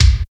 smooth steel kick drum b key, kickdrum tuned to the B note